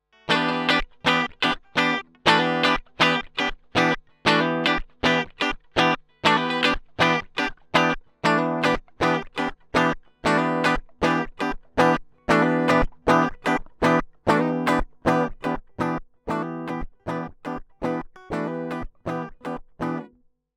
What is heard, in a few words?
Strat passive pure Z Tone Sweep; IK Multimedia Axe I/O Solo